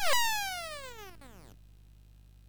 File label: sid MOS 6581R2